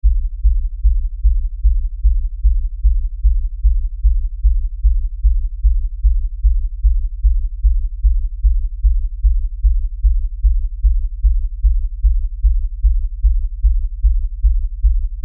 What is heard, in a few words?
Lfe Beat Low Bass Pounding Rhythm